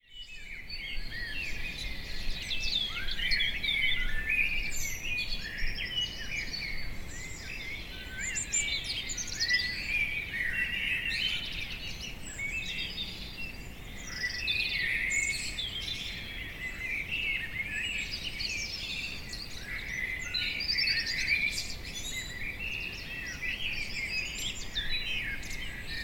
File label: dawn chorus